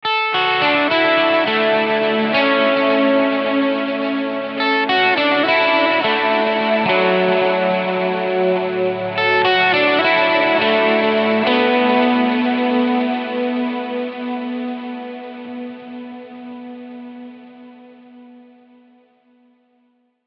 guitar bridge lead

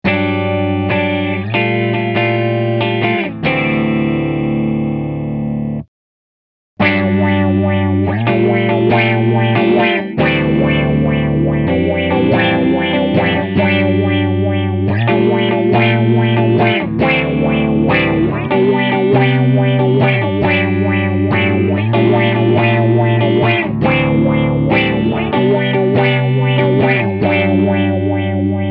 filter; Mobius

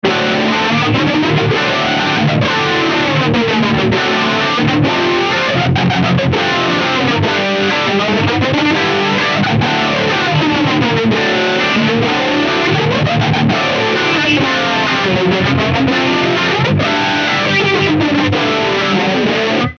flanger 160bpm; mobius

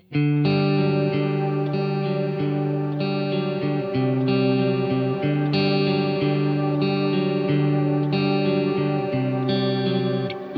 Background Drone; Compadre → Iridium → Cloudburst

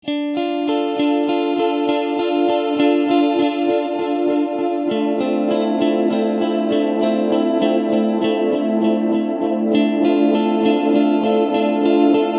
12bit

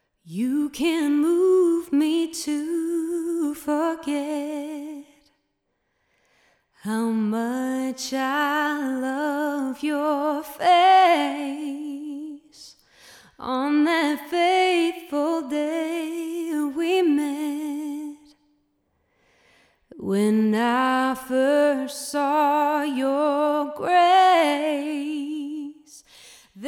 Female Vocal; Uses the Reflection machine set to Rectangle, and a Plate machine set to Small.

